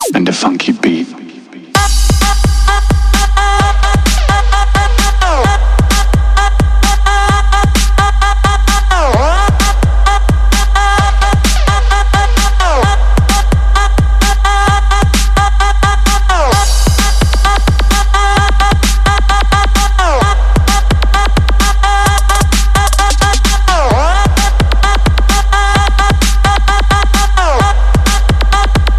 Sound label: Funky Beat